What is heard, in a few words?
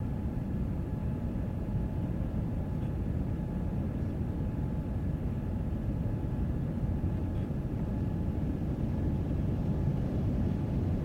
Flight landing